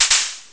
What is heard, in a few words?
gun